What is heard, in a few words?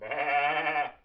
pecora sheep; animali